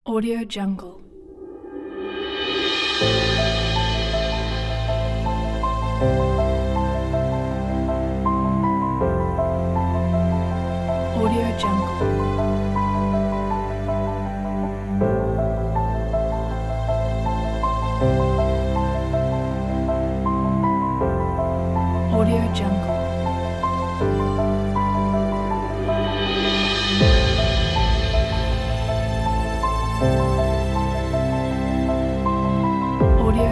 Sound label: inspiring epic; maslukaudio